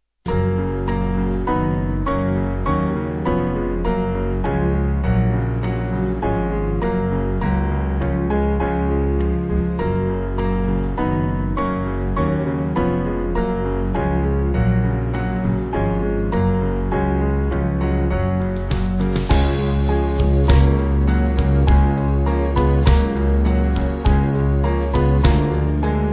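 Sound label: Band, Music Backing Files for Churches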